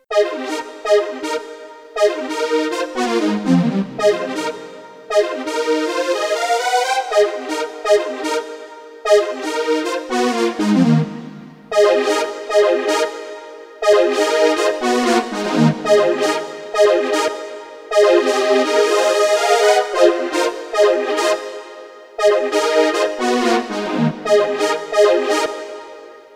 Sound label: Fat and Foamy